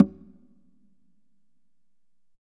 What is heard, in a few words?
Congas Wet; Drums Percussion